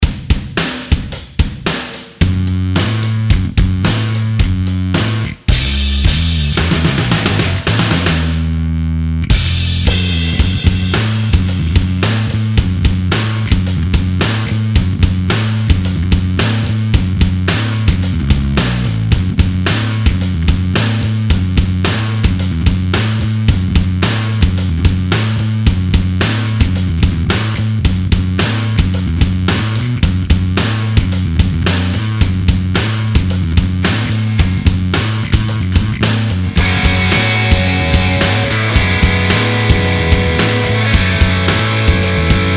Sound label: backing